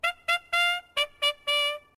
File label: trumpet cross short; jazz